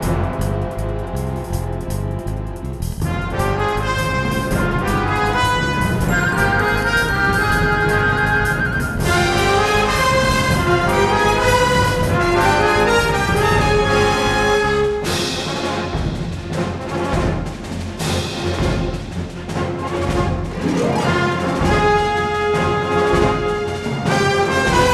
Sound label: MUSIC Boss